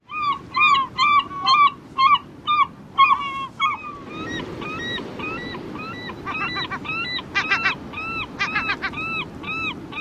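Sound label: typical calls, Glaucous-winged Gull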